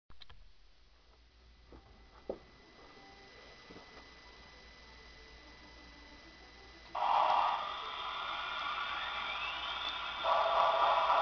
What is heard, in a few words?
steamengine